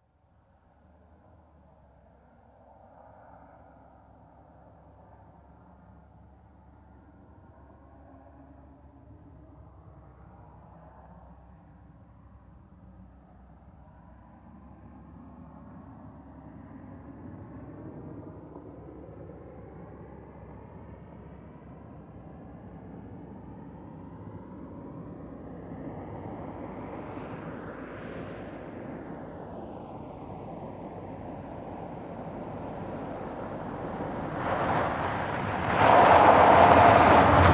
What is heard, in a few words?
Car approaching and parking